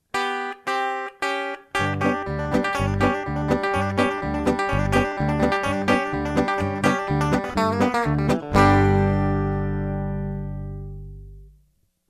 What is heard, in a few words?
lick